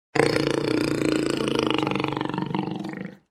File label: jaguar